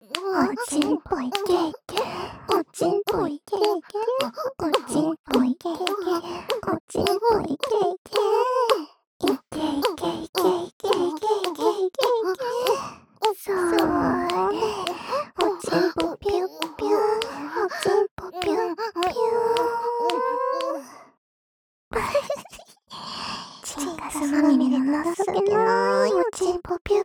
オホ喘ぎ; おまけループ（オナサポ）; メスガキるびぃに馬鹿にされたい！; 射精しちゃえ～～♪

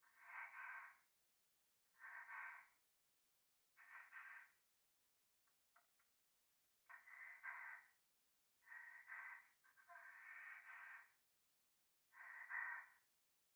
トイレ 左耳 密着 吐息

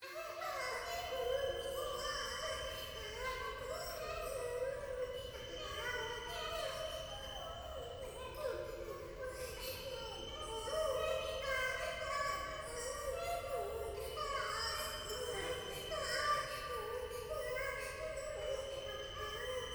風呂 可愛い喘ぎ 山奥, ASMR

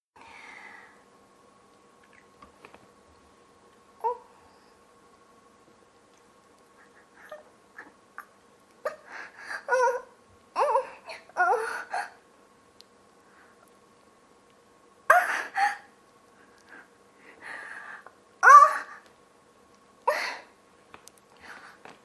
アナルにディルドぶち込まれ絶頂してしまった美少女の喘ぎ声

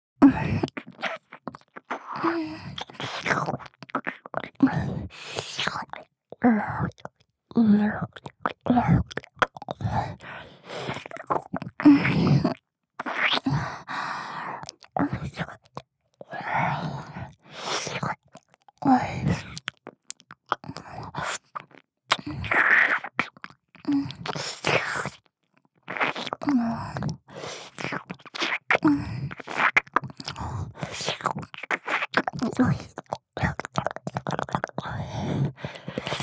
耳舐め ループ

